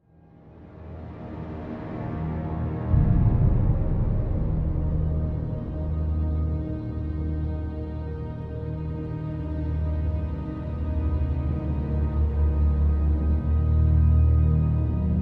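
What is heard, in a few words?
ambient orchestra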